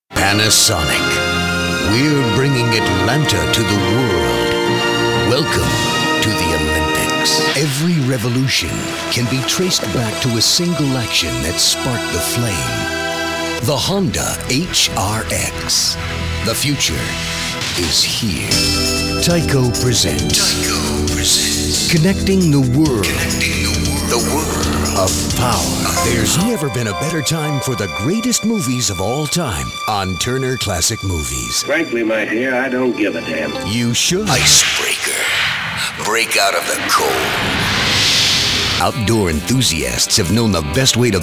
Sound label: industrial